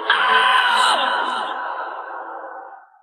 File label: "oh", scream; Voice